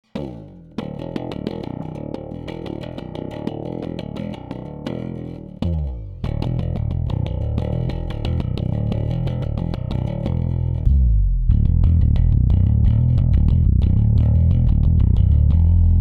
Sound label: Bass; SKC Bogart EBS; Feature minus12 null plus12